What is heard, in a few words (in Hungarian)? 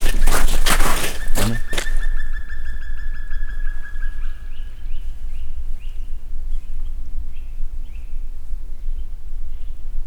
hóban gyalogolva fekete harkály, Visegrádi-hegység; termeszetben